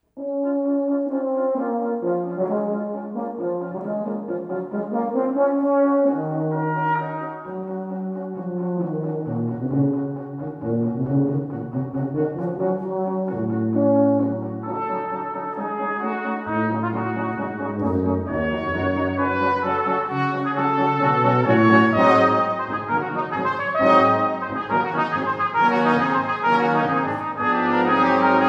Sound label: Brass Quintet In D Flat Major, Allegro moderato